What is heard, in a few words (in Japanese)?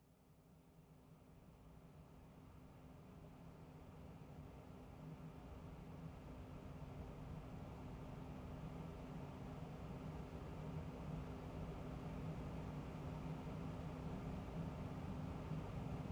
寝台客車で耳かきを（ゴムの螺旋式＆ヘラ型耳かき。ティッシュで仕上げ。右耳）ASMR